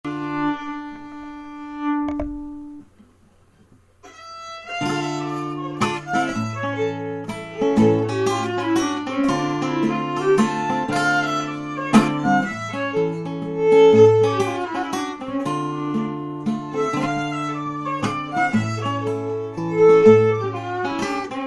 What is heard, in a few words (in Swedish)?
Polska Transtrand m komp